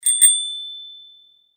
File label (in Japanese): 自転車 ベル